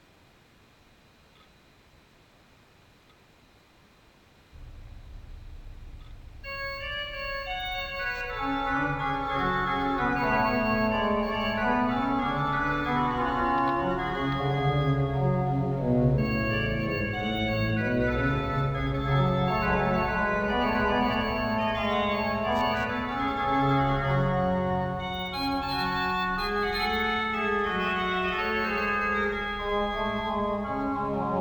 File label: dagincour duo